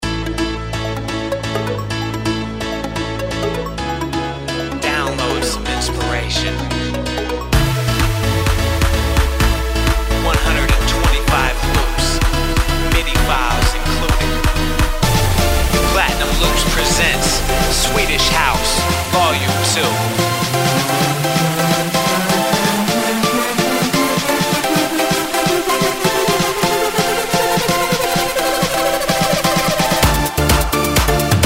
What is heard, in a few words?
swedish house